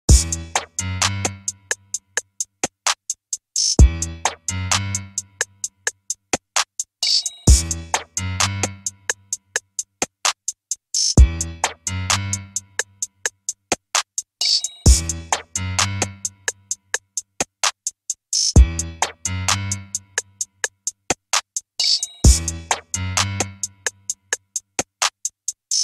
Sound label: Instrumental